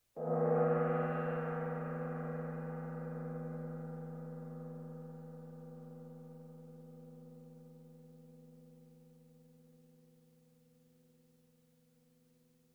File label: Percussion Bongos Tympani rolls Gongs Drums